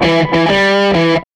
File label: SG Guitar Loop